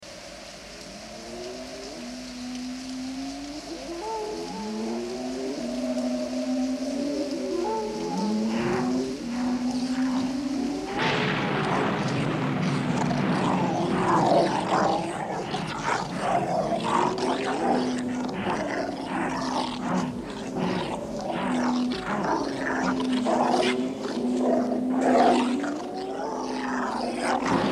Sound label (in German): zähnefletschende Wölfe; Horrorgeraeusche